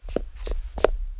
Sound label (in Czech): kroky schody; Mafie 1